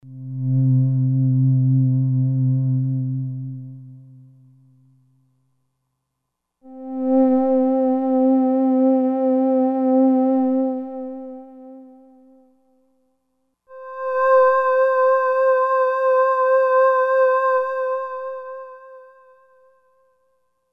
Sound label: Upper Tone, Roland D-50; 37 Soundtrack